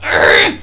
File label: pain; Alien Arena; drfreak